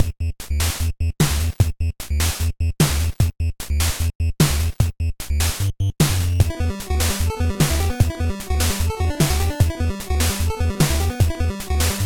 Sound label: game, music; GameJam-polytech